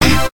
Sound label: bonus chance; Lovely Lady